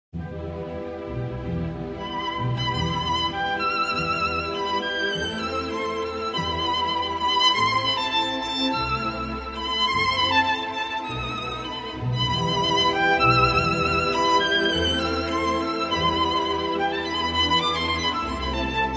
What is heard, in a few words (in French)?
Concerto pour violon et orchestre Theme; Mendelssohn